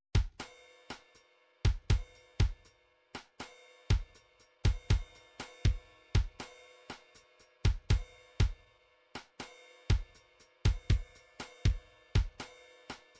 drums, midi drum patterns

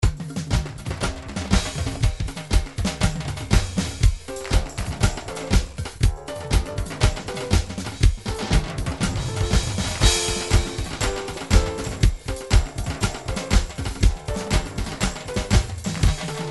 reggae

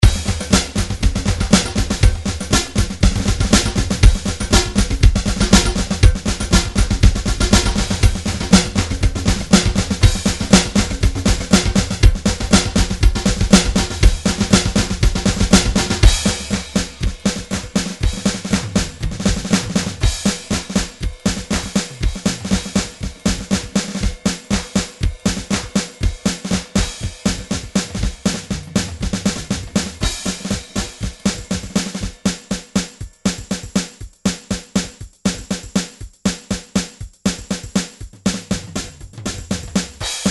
drum grooves midi loops rock; Steinberg Nice Beats 1000

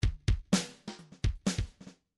bdf midi file grooves funk misc funk misc

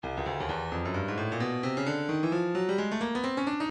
stylus breakbeat midi 131 bpm

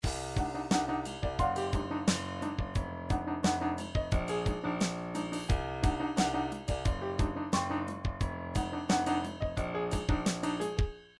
triphop, midi; Newtronic Triphop Construction Kit

